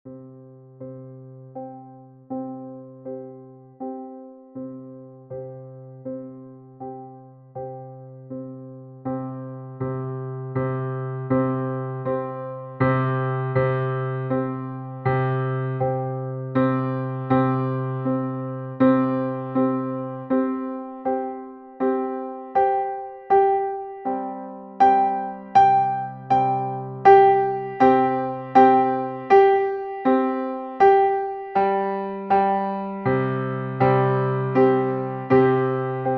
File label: waves for three pianos